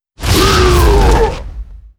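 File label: priest spawn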